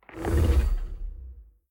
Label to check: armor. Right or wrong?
Right